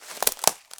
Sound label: Crunchy Step, outdoor, Footsteps